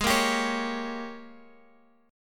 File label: Ab Major 7th Suspended 2nd Suspended 4th Ab, down, Guitar, Open F; AbM7sus2sus4 strummed